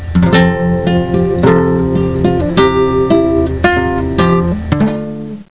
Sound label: classgtr